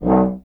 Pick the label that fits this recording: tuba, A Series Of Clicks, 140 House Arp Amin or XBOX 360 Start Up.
tuba